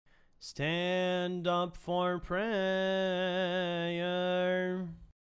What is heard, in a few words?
stand up for prayer